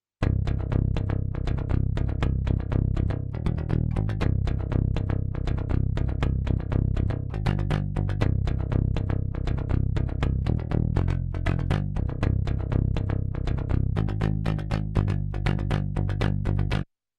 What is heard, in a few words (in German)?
Rock Bass pur